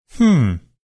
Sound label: male hmmm